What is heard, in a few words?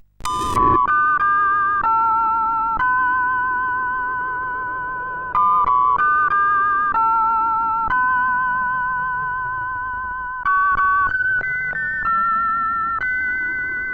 CSG8580R5; sid